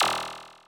noise

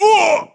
male hit

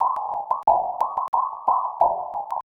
HipHop, Backbts; LiveAct Pool 1

